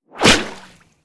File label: Bullets Slice Water